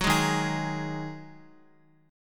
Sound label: Eb Minor Double Flat 5th, Guitar chord; JGuitar